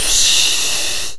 VOX CRASH; RAP CITY 1

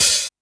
HH, Dance Mega Drums; Best Service; HH-HIP

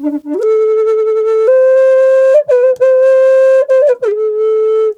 D wild flute; Big Fish Audio - Didgeridoo and Other Primitive Instruments

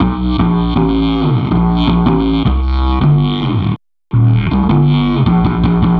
Swoop Bass, Drum N Bass; Sonic Foundry (Sony Creative Software); Knutrix Drum & Bass

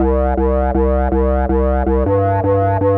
synth, Hardcore Breakbeat 161 Bmin; Big Fish Audio; Hardcore Breakbeat Action

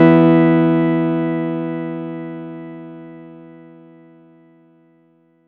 Chord; ModelCycles

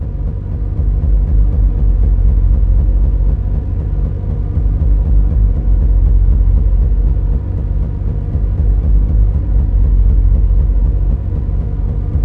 THROB, MACHINE THROB; Zero-G Ambient 1

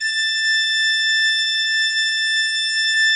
A5, Cathedral Organ; ILIO; Propeller Islands Cathedral Organ

